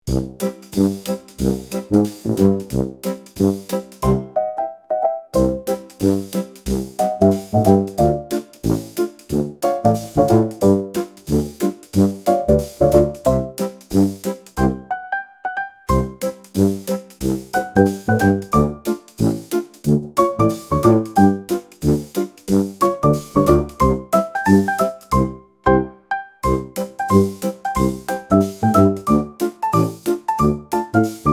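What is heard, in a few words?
splat, happy children's music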